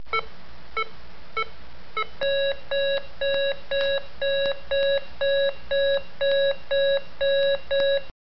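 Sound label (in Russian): звуки пульсоксиметра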